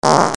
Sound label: CARTOON